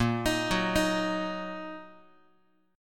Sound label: A sharp Suspended 4th Sharp 5th A sharp down Guitar; arpeggiated; JGuitar; x,6,6,4,7,x